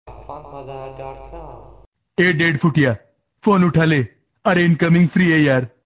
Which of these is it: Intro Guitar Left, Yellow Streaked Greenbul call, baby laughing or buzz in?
baby laughing